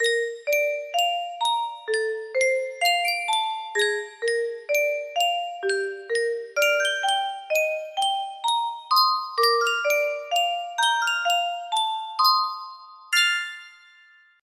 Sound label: Yunsheng Music Box, music box melody